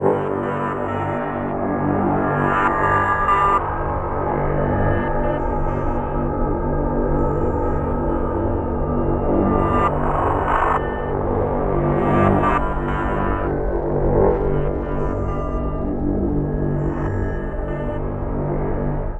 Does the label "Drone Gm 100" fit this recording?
Yes